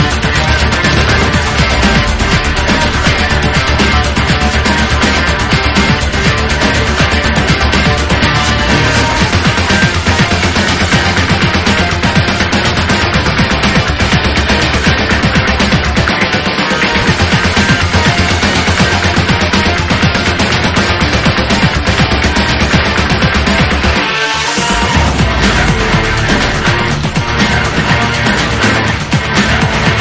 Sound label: instrumental; metal gear rising revengeance ost